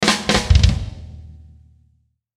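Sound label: Musical Element, Drum Snare March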